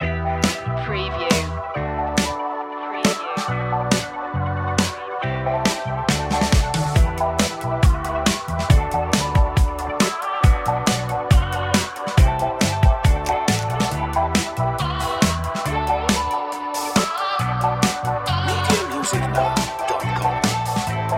fashion reggae

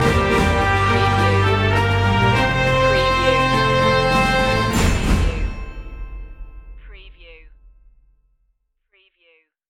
loop ending

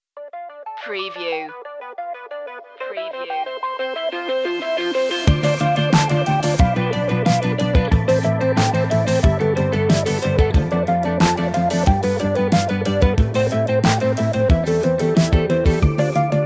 analog epic

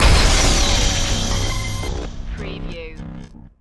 Hit Glitch

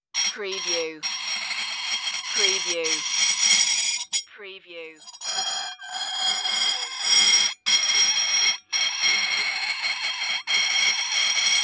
porcelain squeaks

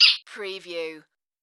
Sparrow

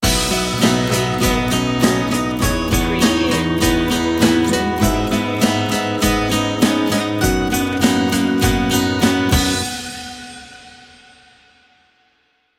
sting

